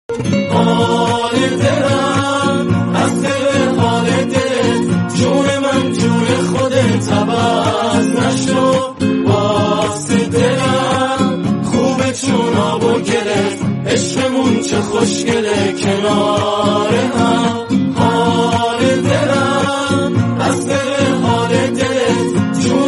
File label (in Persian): Ba Guitar